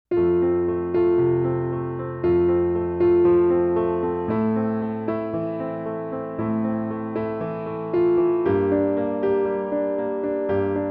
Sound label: solo piano